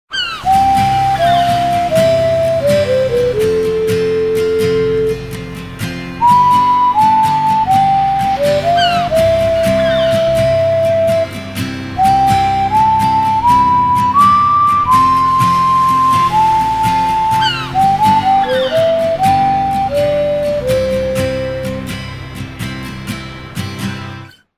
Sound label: Alarm, alarms; Etuline S4521